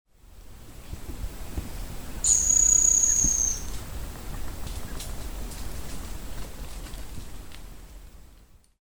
Picumnus cirratus Picapauzinho barrado

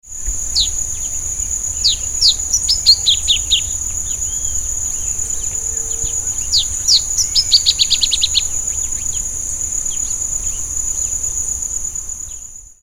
Geothlypis aequinoctialis Pia cobra

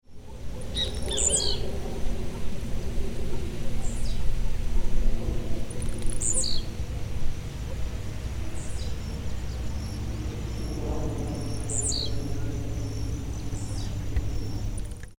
Volatinia jacarina Tiziu

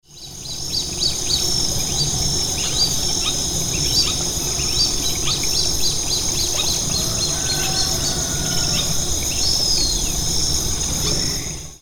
Amazonetta brasiliensis Pé-vermelho; Cordeiro RJ Centro

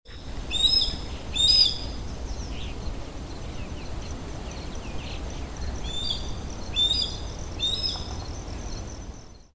Parabuteo unicinctus

